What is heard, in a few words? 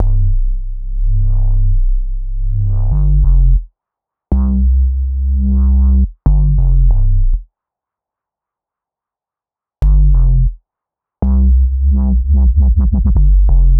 Bpm139 Fringe Bass Loop Cm, Dubstep; Prime Loops; Dubstep Illusions